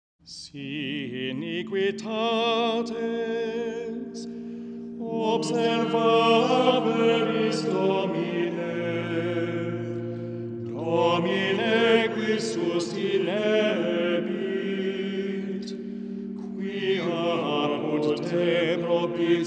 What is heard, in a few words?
Mode 3 Ordinary Time Latin